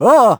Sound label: ice slip; Crypt of the NecroDancer